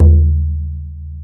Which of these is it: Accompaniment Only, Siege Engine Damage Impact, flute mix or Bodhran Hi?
Bodhran Hi